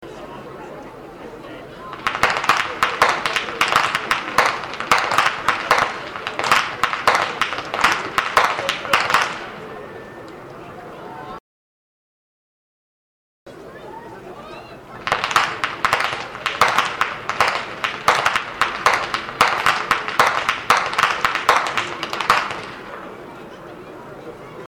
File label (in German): schnalzen; jugend